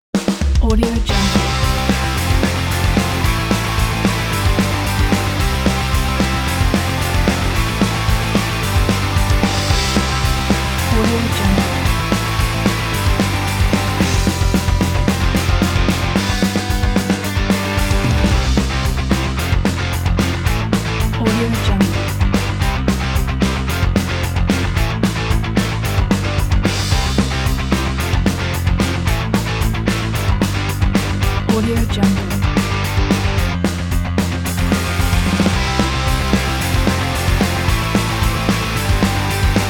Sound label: drive punk